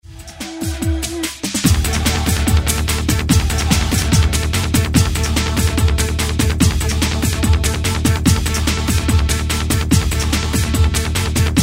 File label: distort